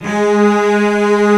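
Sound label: Cello Legato G#3; Multisamples; Digital Sound Factory Virtuoso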